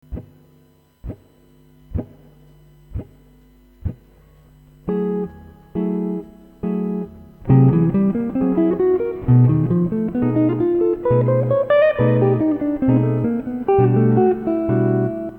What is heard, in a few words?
Lick